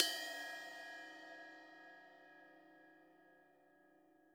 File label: Trash, drum